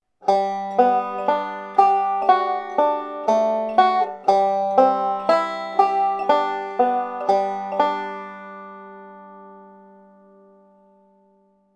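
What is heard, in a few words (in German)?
reverse roll mit akkordwechseln, banjo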